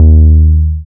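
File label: 808 D sharp high, drift phonk; drill toolkit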